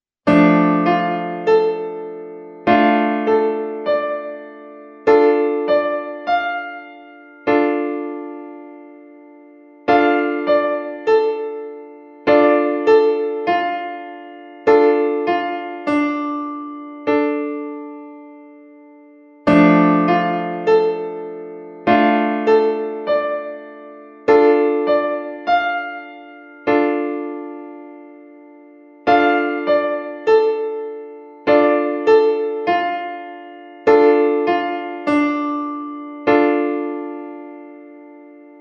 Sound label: Dm Piano Keyboard Chord